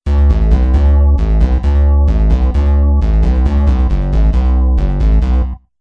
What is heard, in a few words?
BASS; d-50